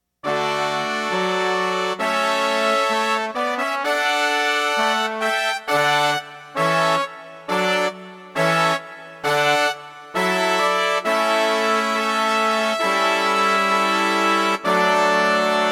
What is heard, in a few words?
Trumpet Voluntary